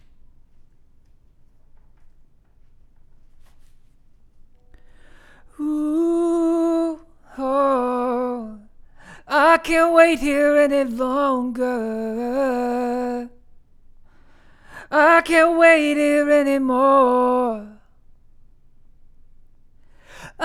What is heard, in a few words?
Vocal